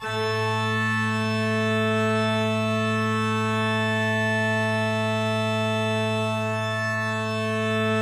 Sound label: organ; pianojs